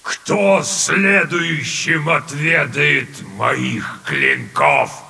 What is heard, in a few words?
attack killed; Illidan